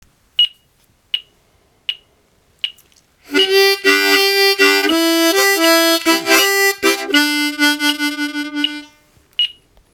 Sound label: Vamping turnaround